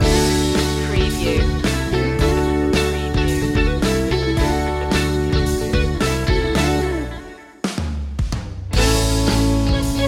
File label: run for cover loop, pop rock